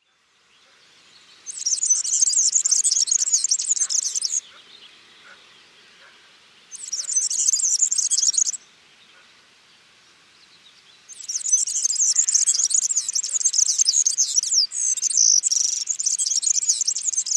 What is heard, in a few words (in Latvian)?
dziesma, balsis; riga krustp iela; a.celmins